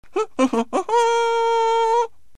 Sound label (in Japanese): ふんふんふんふんふ〜ん♪; inai inai baa oekaki wanwan; flash gamerip